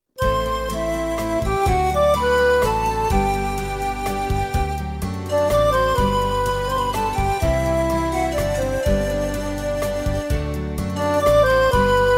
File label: waltz